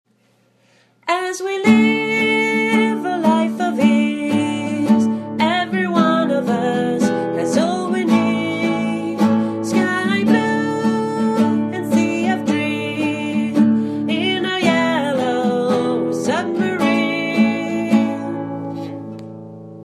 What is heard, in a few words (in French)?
Couplet Chanté